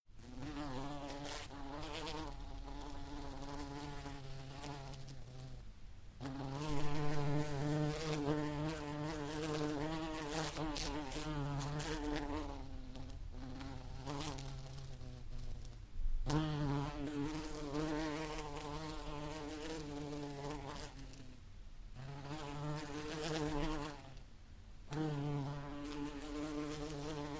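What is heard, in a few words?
pchela zvuk, bee sound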